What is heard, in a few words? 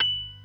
PERC B 3; B3 FULL